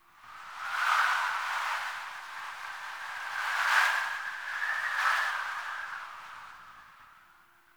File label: Wind Gust; Warframe; Eidolon Plains; Ambience